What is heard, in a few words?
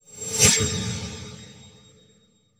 Flash Frame Heavy; Title Sequence Effects; Sony Pictures Sound Effects Series